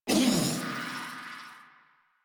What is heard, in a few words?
Pain, Barks, Battle; Warframe; Zealoid Prelate